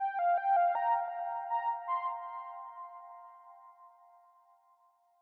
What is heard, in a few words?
melodic arpeggio; arpèges; seeding-planets